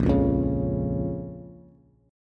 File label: A sharp Minor A sharp down Bass; strummed; JGuitar